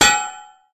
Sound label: Dink; SnootGame